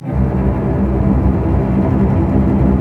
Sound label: STR, Roland LA Composer